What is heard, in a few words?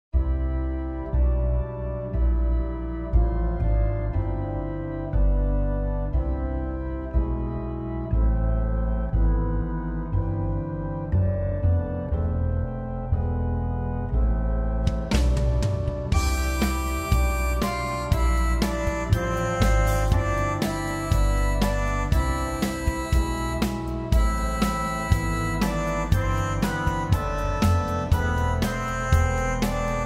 organ reeds